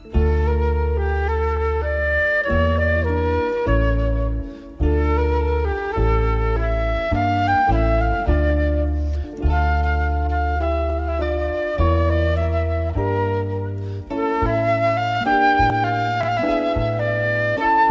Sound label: smooth flute